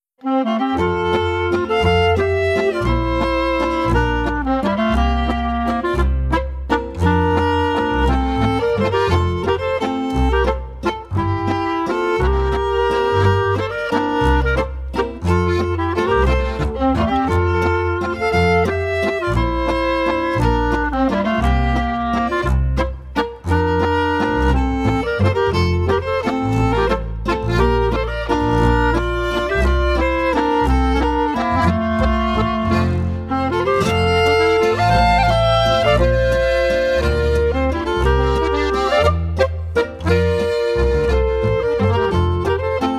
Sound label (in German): Schutzengelwalzer